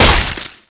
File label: ghoul hit, claw; cstrike